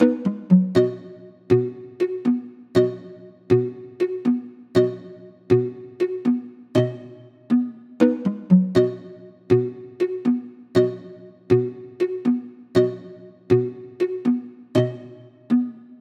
Calm ringtone

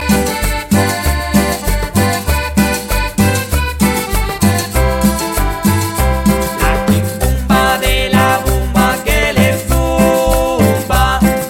Cumbia

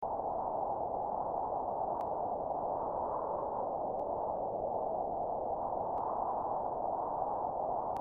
Wind Message

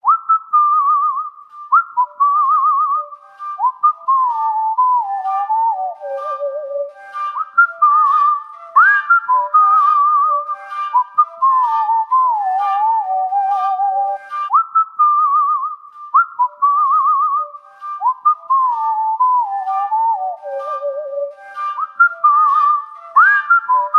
Whistle

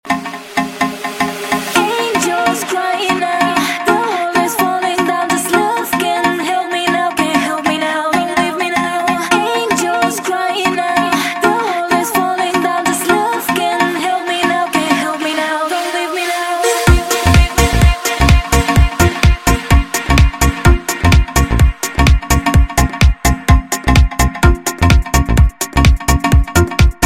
New House Bomb

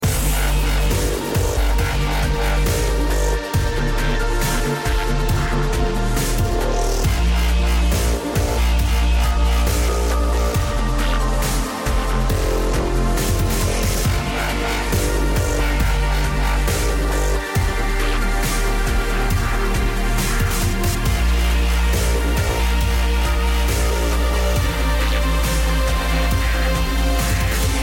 Trance Meets Dubstep

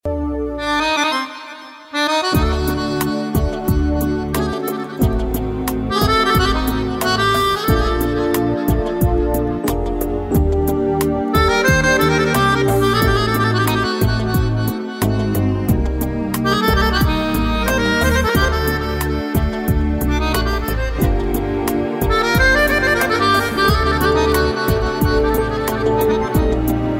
Jewish Melody

